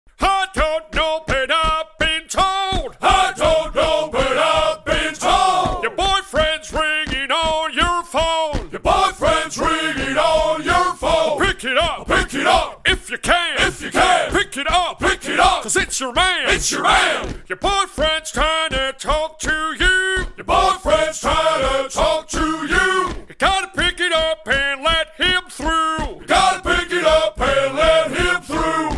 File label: boyfriend calling